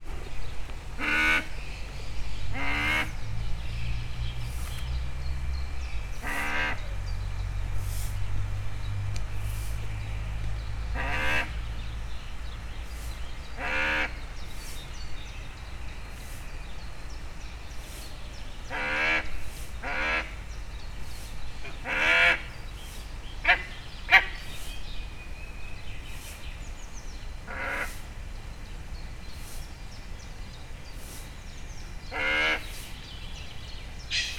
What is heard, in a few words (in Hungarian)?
közelről távolabbról aranyfácán, parlagi sas; debreceni zoo 2012